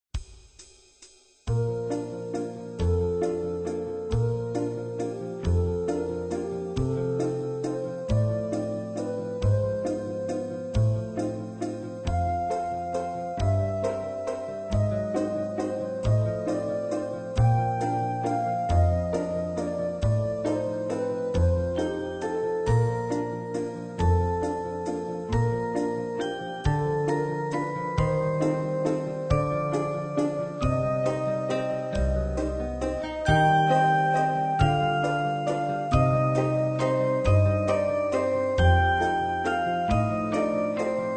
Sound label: Sleeping Beauty Waltz